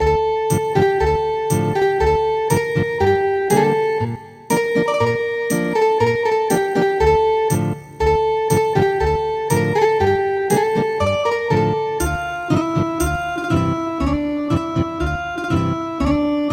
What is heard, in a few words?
Ethnic Guitar